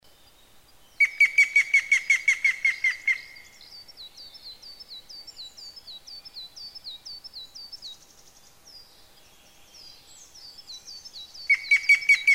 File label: yamagera, bird